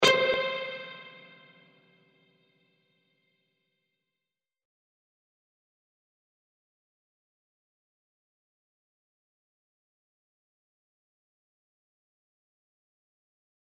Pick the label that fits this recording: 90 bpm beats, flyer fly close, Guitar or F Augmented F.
Guitar